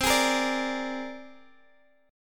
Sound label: C 7th Suspended 2nd Sharp 5th, Guitar, Open G